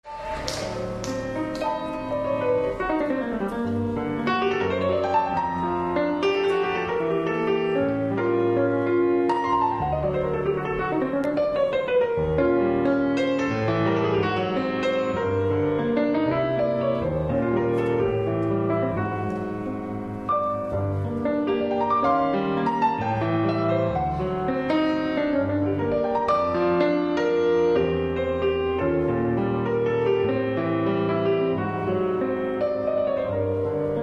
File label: sax quartet swing; kyiv